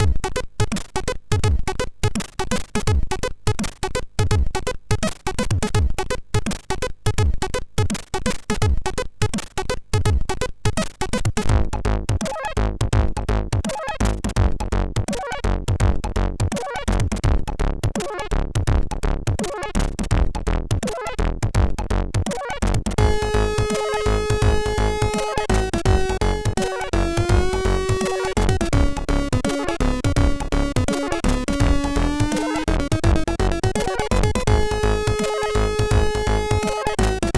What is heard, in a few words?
Funky, sid MOS6581R3